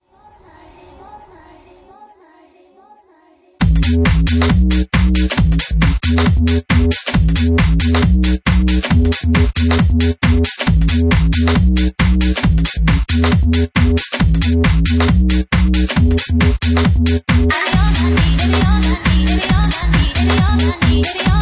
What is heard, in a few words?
Speed Garage Remix